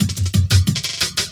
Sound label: LOOP, Drum & Bass, 180 BPM; Ueberschall; Drum & Bass Resonance